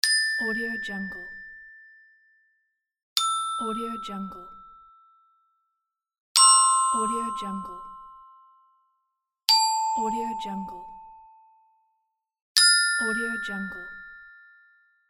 right answer idea; medusamusiclab

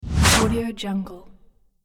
arrow whoosh sound

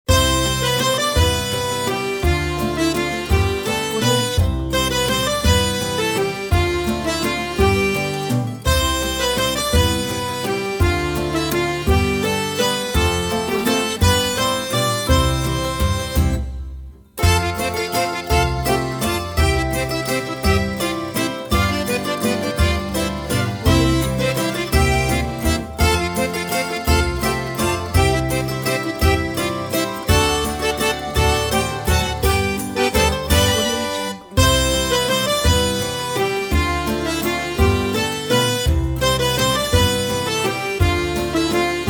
italy vacation

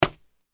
boxing glove slap; hollywoodedge

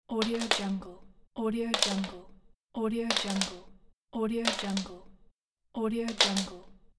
wire drop

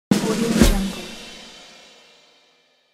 drumroll short

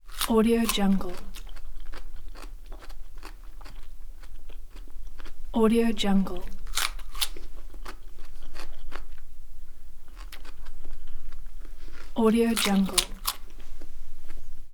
eating biting crunchy food; mehi production

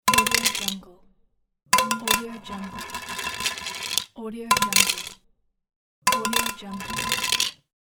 cd falling swirling

